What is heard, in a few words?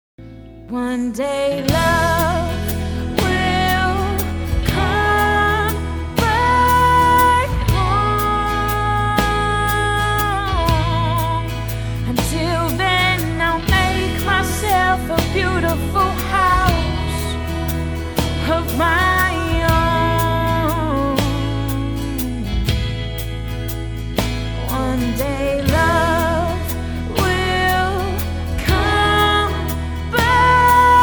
Singing